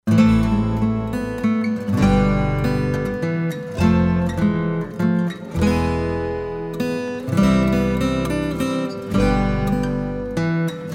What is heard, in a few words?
Minuet; Purcell